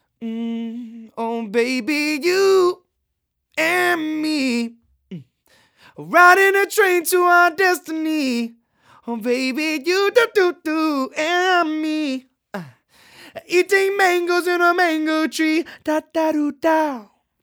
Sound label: lct 440 pure male vocals; lewitt